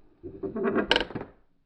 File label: house creak; ambient; inferno